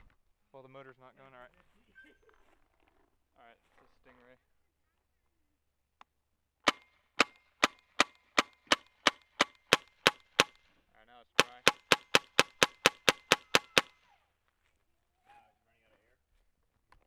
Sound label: stingray, guns; stingray maybe clip